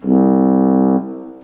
boat horn; trasporti

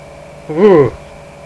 SHIVER

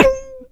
BING, cartoons

